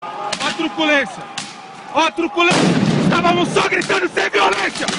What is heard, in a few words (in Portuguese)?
manifestantes fazem protesto pacifico gritando; estavamos so gritando